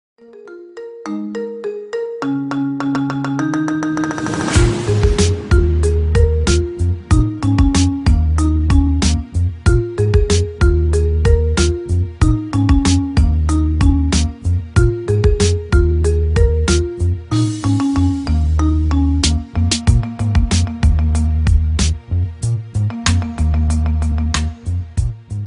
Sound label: marimba ringtone remix